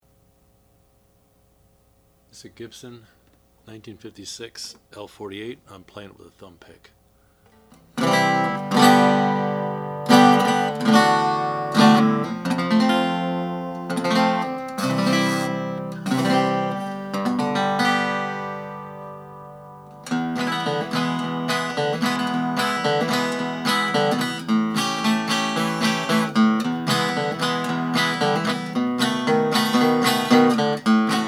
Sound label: gibson l 48 sunburst